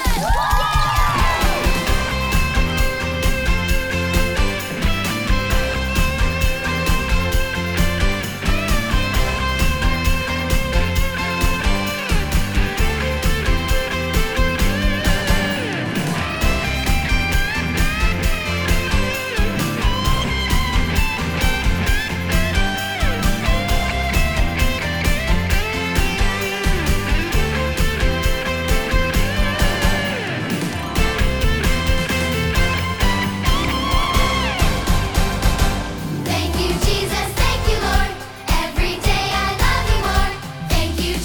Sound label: Curtain Call Music